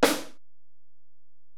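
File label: Acoustic Snare, Alesis DM-PRO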